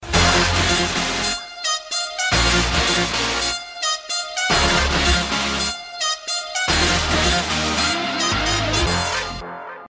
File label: tone; dhoom 3